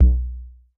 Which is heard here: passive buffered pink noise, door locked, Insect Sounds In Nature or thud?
thud